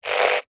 technology radio weak signal buzz static very short burst